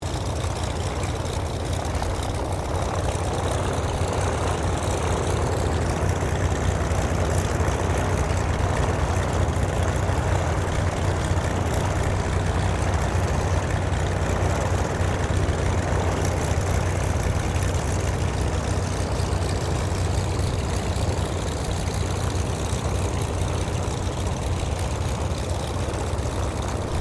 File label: AIRCRAFT CAC WIRRAWAY IDLE